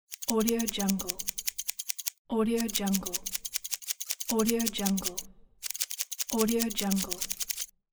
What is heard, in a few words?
hairdresser trimming hair with scissors